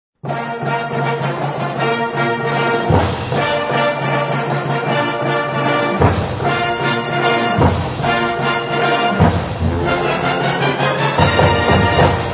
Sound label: Fanfare; Abilene Christian